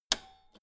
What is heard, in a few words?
old button press jump out